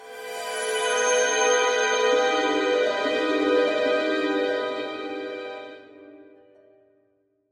Stinger Light; Angry Birds Star Wars 2; Crimson

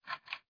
Lamp; Learn to Fly 2

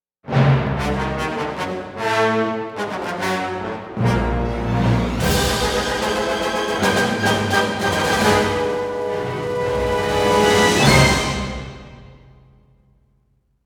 Battles Imperial Win; Hoth

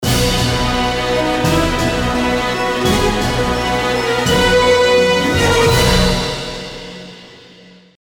Match Win; ratchet clank into the nexus

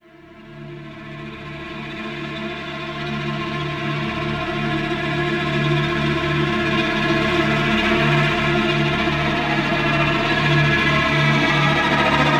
Suspense

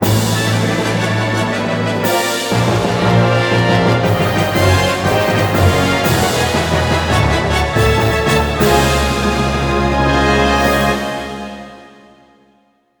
Win Fanfare; Yu-Gi-Oh! The Falsebound Kingdom

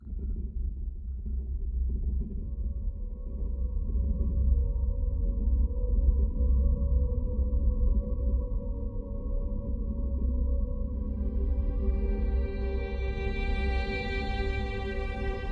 Music Ambience Loop; windows gamerip 2016; The Emerald Maiden

